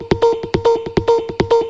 140 bpm hexbeat

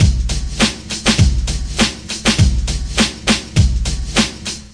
101 bpm unstopable; drum loops and breaks; bigfish

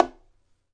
8 bongos bongo; Eight Bongos

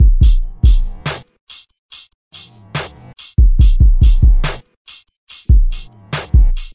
142 bpm dark kick hat snare groove; drum loops and breaks; 141 to 160 bpm

